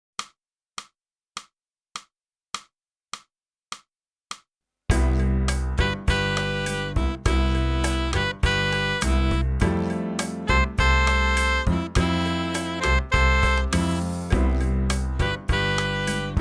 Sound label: sax feeling sunny